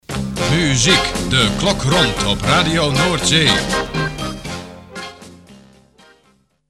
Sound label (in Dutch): Muziek de Klok Rond; ZeeZenders